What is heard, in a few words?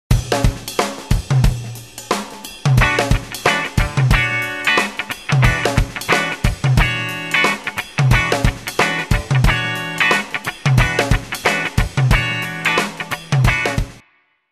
Rhythm Guitar